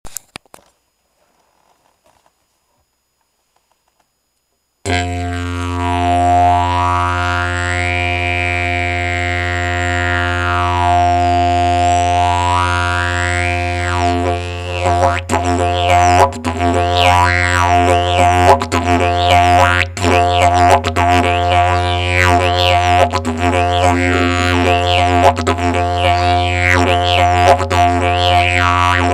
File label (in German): Birke F, didge